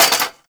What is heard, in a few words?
Cafe Chair Short; Warframe; Nineteen Ninety Nine